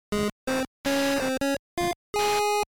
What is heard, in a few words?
Retro 16 bit or 8 bit success melody win victory